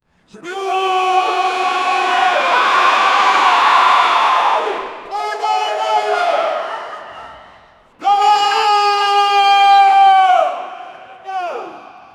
hockey player screaming

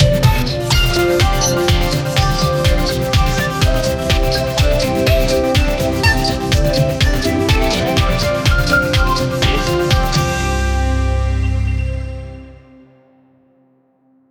intro police crime live, police

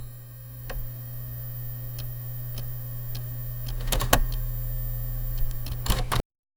starting up an old computer

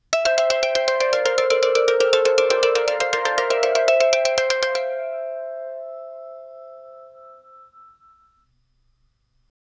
basic scale sounds played, musical instruments

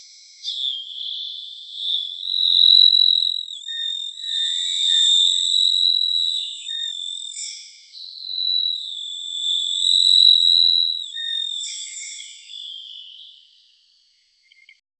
arizona coral snake

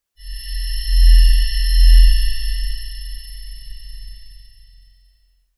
a sharp clink as the

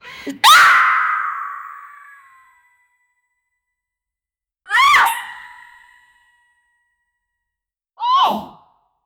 design anime style punch

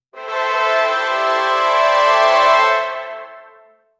medieval victory trumpets; instruments

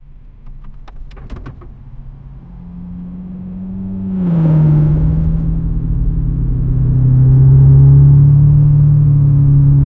sports car driving the

